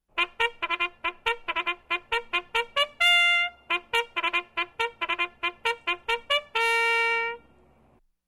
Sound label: Trumpet First Call